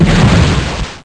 Hit; Big bang

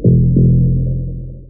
Bom Bom; Realmz 3.1

